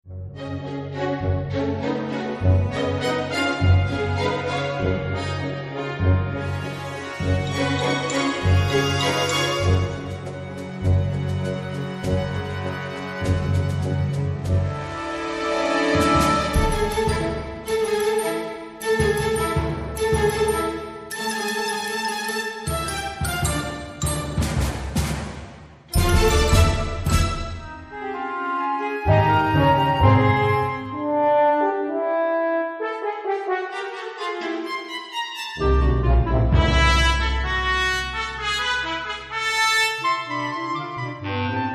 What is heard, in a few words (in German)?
Klassik; klang-fabrik